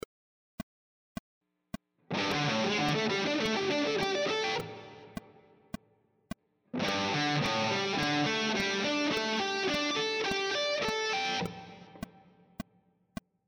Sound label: triad arpeggio sequences, guitar